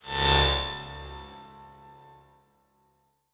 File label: Transition Metallic Swell; Production Element